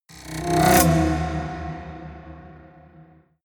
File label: haunted strings roll